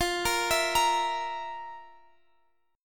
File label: F Major 7th Suspended 4th, Guitar, Standard; arpeggiated; capo 9